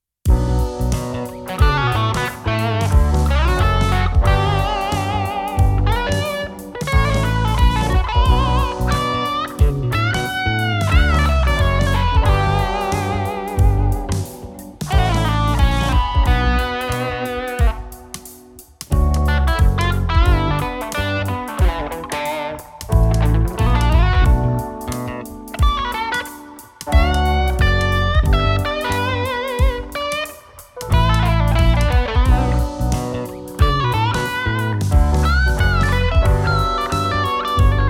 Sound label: Groovy Jam